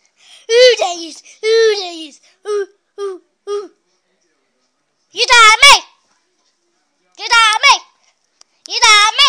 my little 5yr old girl singing a couple of spurs songs